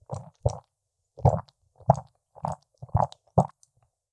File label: pump; Lewd SFX